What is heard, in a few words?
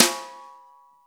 BRUSHES